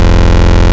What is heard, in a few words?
Octave Saws, wavetables; Animoog